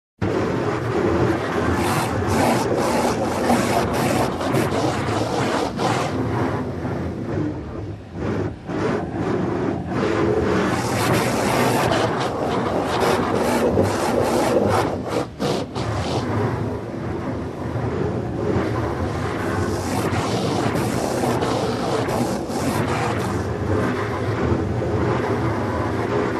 Heavy Detailing Brusher

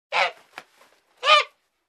Duck quack

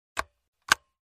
Computer keyboard slow button press

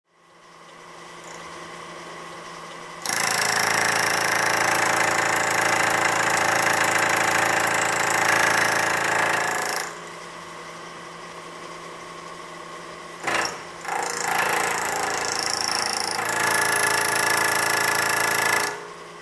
Industrial Drilling Machine

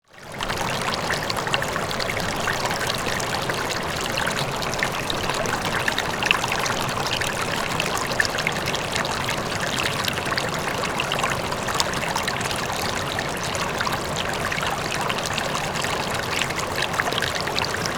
Small waterstream flow

